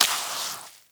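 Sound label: Fx Paint Swish; Orleans; Draw Wallpaint; Fx Paint Swipe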